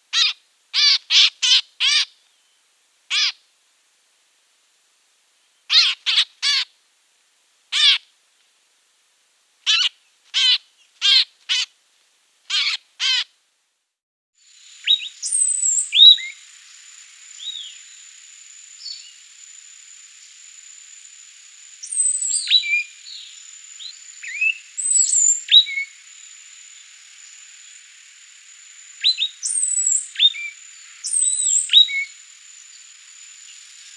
Moqueur grivotte Scaly Breasted Thrasher, chants des oiseaux; Guadeloupe